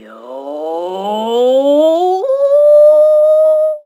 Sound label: PRC F, SHO PERC PAD; Best Service ProSamples vol.55 - Retro Sampler; AKAI